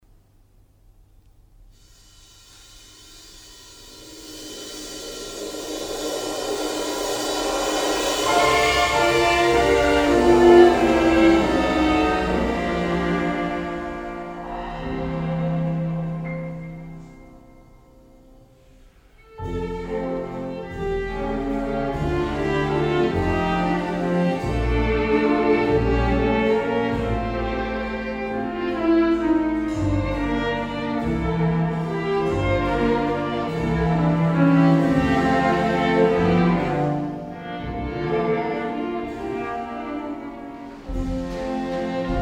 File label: Salonorkest; STC 4021; Snow White